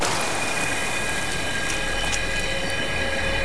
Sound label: pounce leash; weapons; immortal; dota; slark